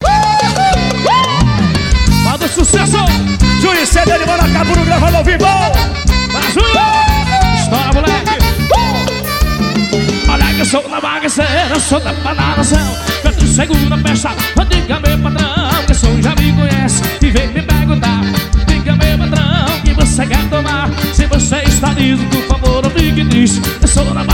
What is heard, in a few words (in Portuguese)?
forró ao vivo